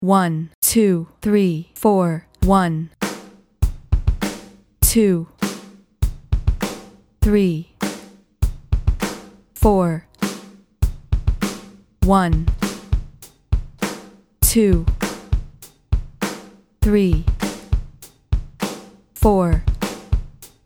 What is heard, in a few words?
Rock Grooves FAST 100bpm